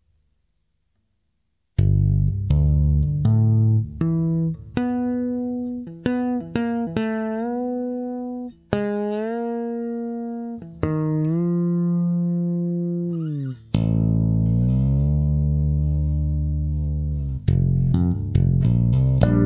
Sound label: Fretless Stick